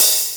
dry open high hat sample e key, Royality free open hat sample tuned to the E note